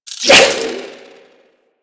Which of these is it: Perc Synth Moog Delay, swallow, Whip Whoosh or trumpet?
Whip Whoosh